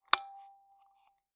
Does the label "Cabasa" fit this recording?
No